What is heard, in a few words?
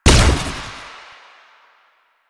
Fire Shot, Weapons; Duviri; Incarnon Adapters; Incarnon Dera Vandal